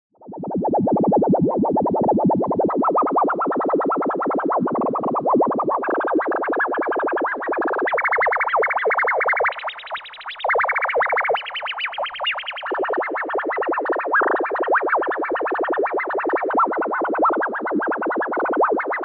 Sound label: ARP Jungle; TTSH Patchbook